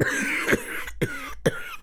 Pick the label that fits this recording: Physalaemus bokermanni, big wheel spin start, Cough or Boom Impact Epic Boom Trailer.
Cough